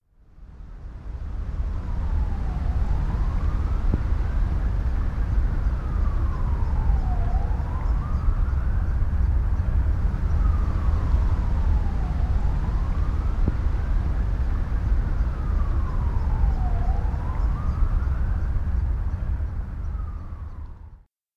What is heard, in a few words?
merri siren train; Provenance 2007